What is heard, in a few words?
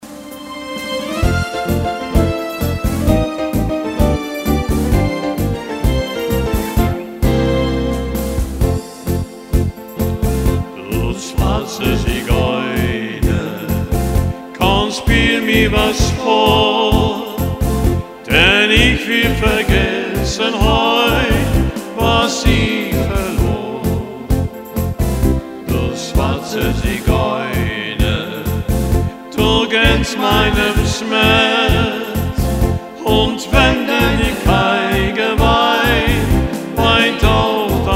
Tango